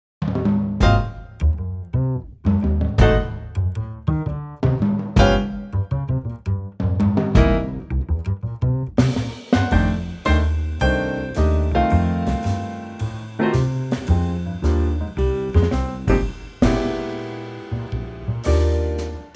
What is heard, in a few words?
Eb Trio; key - Eb - vocal range - Db to F